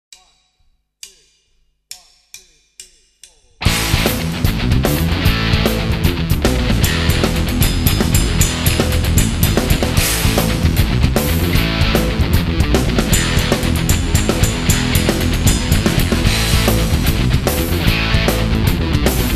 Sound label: Backing Track